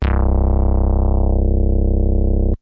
Bass Sweep

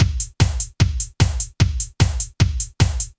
Happy Hardcore

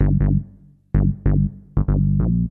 HipHop Bass

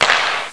Hockey Stick Sound

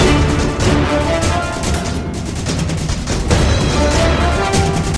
Orchestral Comp

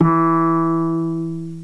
Jazz Bass Lick

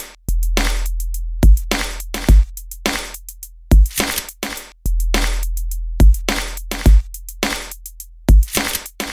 Hip Hop Missing Kick

